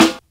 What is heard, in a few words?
good steel snare drum sound e key